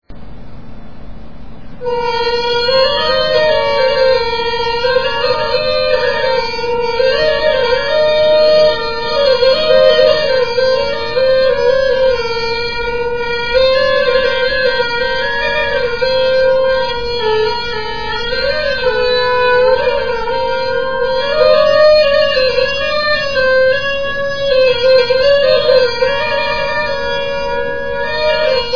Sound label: mezwed; Etnologia